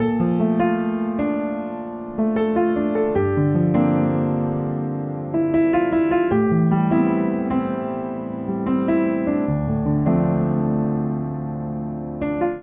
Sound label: Phrase R&B vimaj7-IVmin7-Vmin7-I 76bpm D minor, Upright Piano; MIDI Clips; R&B Classic; Upright Piano by Spitfire Audio; Ableton; Factory Packs